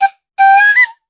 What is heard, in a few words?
edgy flute melody; MELODIC SAMPLES